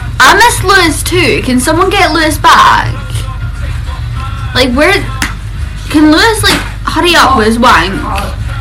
ass clap

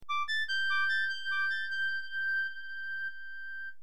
flute

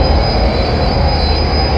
x wing engine; lego star wars

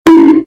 bonk

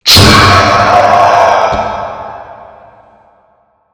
jumpscare sound; five nights at maggies 1

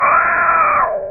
agu cry

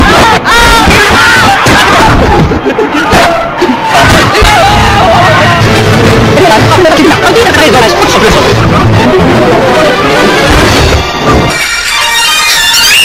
sao tome et principe eas alarm

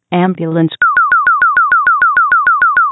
ambulance chirp

